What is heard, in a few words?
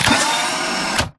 Mvmt Servo Damaged Head pivot Long; AI Stalker; Titanfall 2